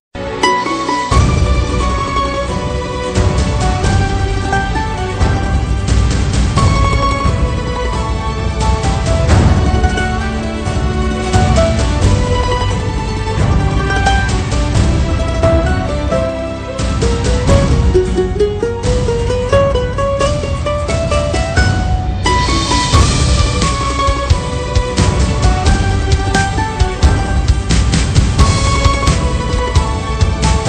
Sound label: instrumental ringtone hindi song ringtone; shahrukh khan and kajol